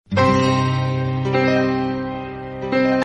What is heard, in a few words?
cool music message tone ringtone, sms ringtones